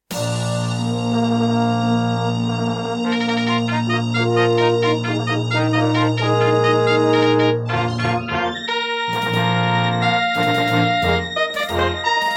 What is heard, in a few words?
Intro, Serdaco Crystal Blaster C1; Dark Forces